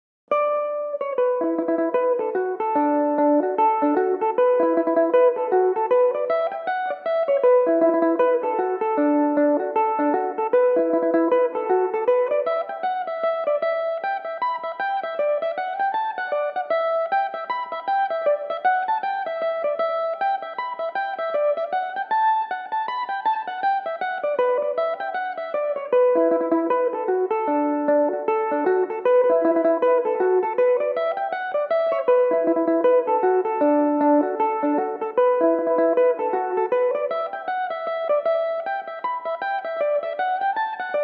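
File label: E dorian, irish mandolin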